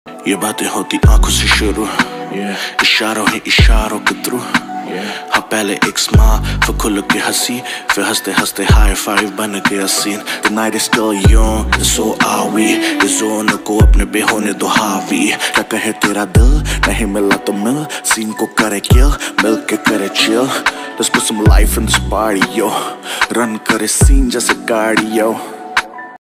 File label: hindi rap ringtone